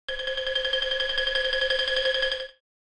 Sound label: Cartoon funny xylophone